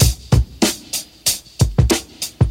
96 bpm fresh breakbeat sample b key

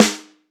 snare single hit A key; drum single hits one shots

